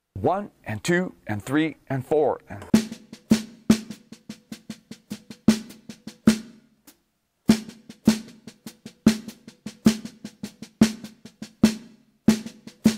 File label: Melody Triplets